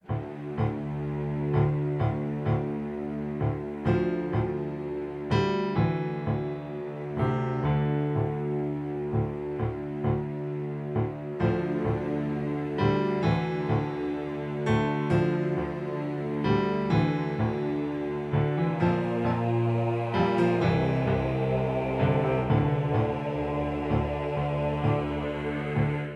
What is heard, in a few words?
golem loop